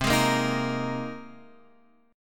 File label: C Minor 7th Sharp 5th C down